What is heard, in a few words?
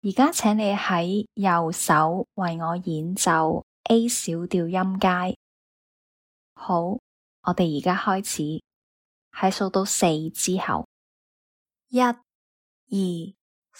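A minor scale right hand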